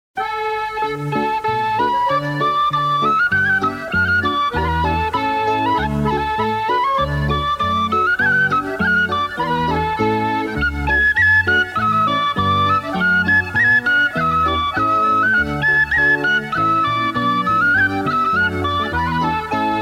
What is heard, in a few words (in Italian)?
flauto di latta